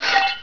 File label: metal item